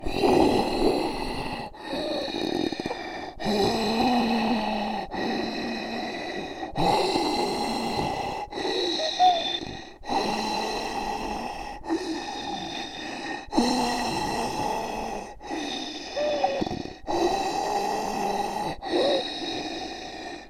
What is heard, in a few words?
zombie breath